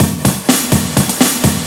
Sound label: drum loops; bb drum loops